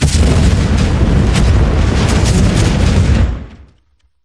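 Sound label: magic fire concentrate big loop; skyrim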